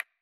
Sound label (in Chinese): UI扫过; UISound